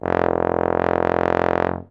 BARITON; Best Service; Alpine Volksmusik

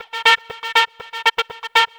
Techno Synth

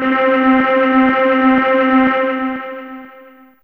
Arr Bass C4, Basses; Fairlight CMI IIe

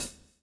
swing

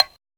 VEE Percussion; Percussion Instruments

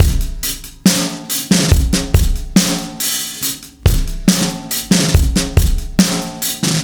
Acoustic Kit, Drums & Percussion

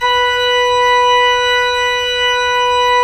ORG P. ORG; Roland - LA Composer vol. 1

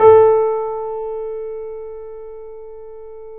A, The Piano; InVision Interactive - The Piano; BOESINGSOFT

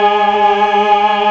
TRON MALES, Vintage Instrmt; E-MU Formula 4000 Series Vol. 5; Protozoa

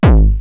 Roland TR-909 BD; BD Selection

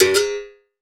Berimbau Up, Percussion; Roland BK-5

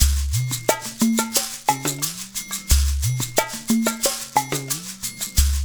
LOOP, Percussion

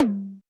Roland U220 Electric Set, Drum Machines

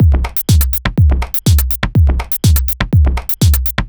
B 123bpm, House Drumloops; Zero-G House Fabrik Drumloops

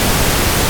Full Pink Noise; Proteus 2000; Digital Sound Factory Proteus 2000

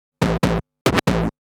Scratch Fills 140BPM

